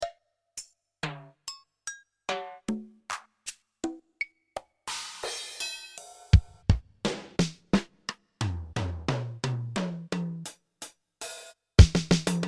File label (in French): TR-626 son, Electronique